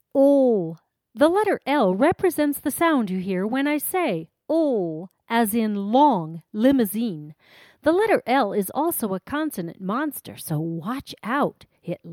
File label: Maracas